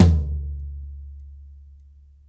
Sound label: tom lo, acoustic drum